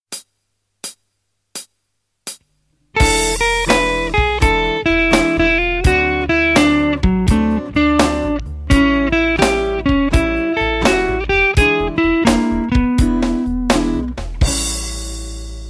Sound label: Blues Turnarounds, guitar